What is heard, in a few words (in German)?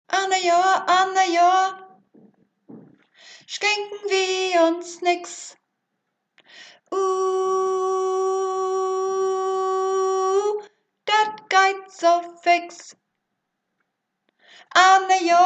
Swing Version - Sopran; Lied